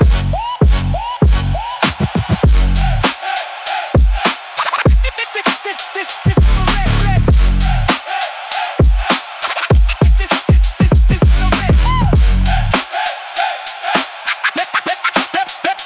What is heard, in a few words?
Street Hip Hop Battle; AdobeStock